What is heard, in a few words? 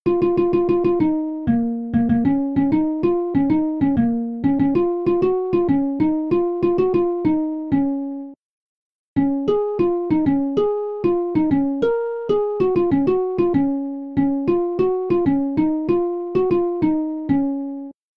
Instrument Harp